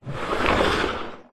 tub plastic roll slide carpet; foley

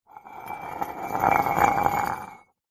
cup mug scrape drag concrete; household; zapsplat

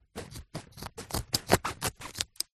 clothes denim jeans cutting scissors; foley; zapsplat

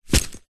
foley luggage camera bag set down; zapsplat

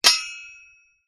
industrial metal rake hit ground; zapsplat

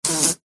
insects fly trapped short wing buzz; zapsplat

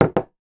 household mug coffee down table; zapsplat

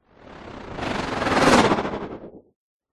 sound design whoosh white noise stuttering fast

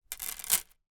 necklace plastic down on wooden table

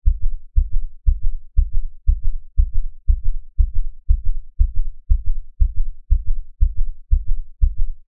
human heartbeat fast designed; zapsplat

